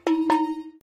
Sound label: Genie Cow Jump; doodle jump 2; android